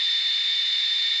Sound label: turbo whine